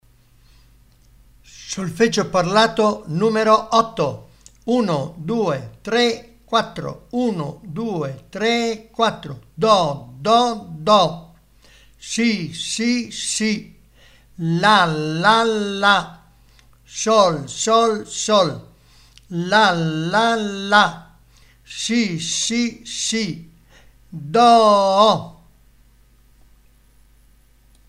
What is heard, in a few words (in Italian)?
Solfeggio Il mio amico flauto